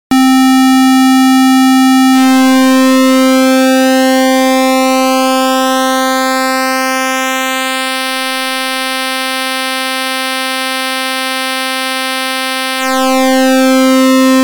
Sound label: SH-101 Pulsewith; Roland Cloud